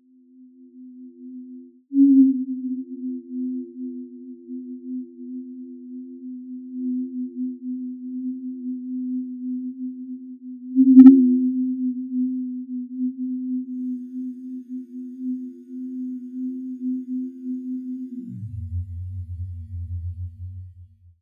Loops mutantes

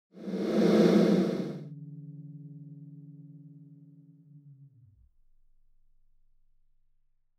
Loops mutantes

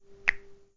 chasquido

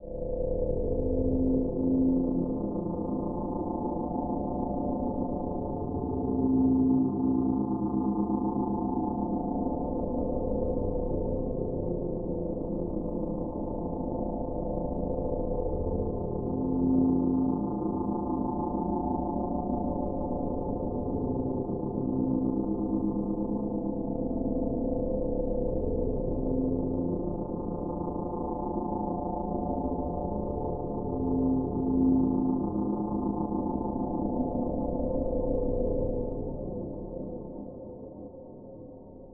Loops mutantes

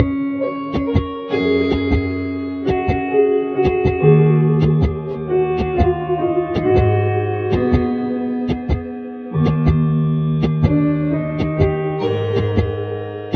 Latido del Piano

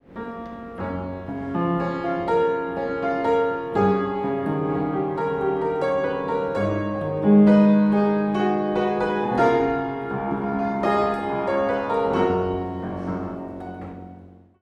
Loops mutantes piano cama

